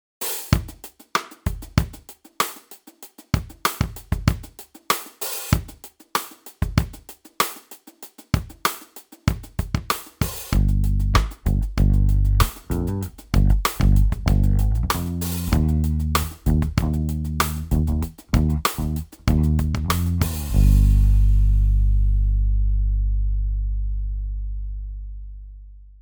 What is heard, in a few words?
50 hz in music